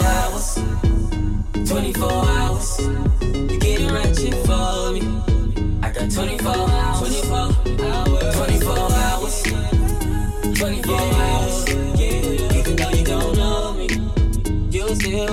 funk; multitrax

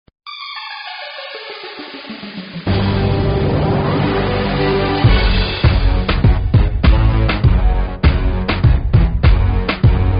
funk; multitrax

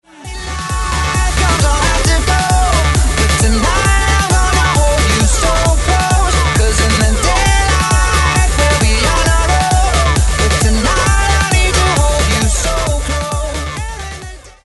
funk rnb hits remixed; multitrax

